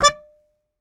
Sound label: Very Short Note, Melodica